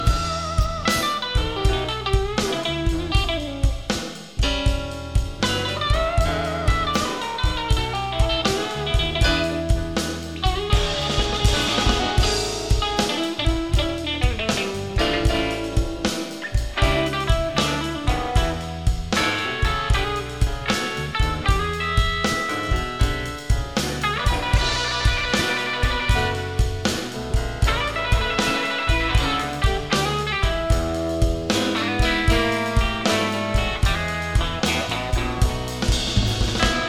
Uptempo Pentatonic Slow Blues 6 8 feel 12 bar Guitar Soloing